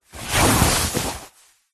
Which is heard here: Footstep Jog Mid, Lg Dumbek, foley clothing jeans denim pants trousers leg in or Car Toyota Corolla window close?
foley clothing jeans denim pants trousers leg in